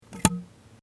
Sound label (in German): cd spindel